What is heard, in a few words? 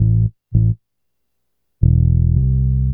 Bass, Futurist Drum & Bass